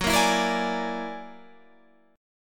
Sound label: Gb Augmented 9th Gb down Guitar Standard; Gb+9 strummed